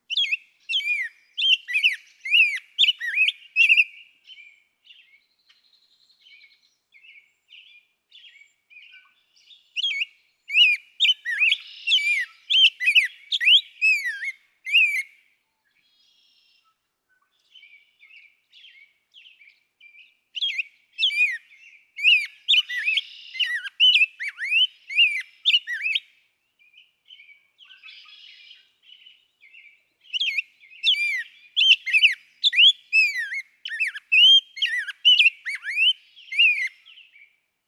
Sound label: Rose-breasted Grosbeak